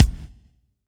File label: Elec Kick, drum